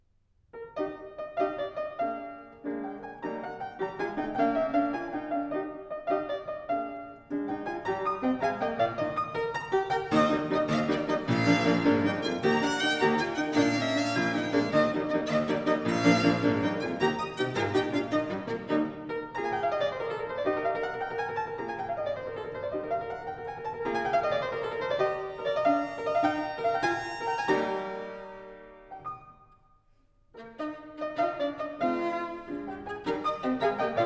Sonata for violin & piano No. 28 in E flat major, Rondeau. Allegro; Mozart; Les grandes sonates viennoises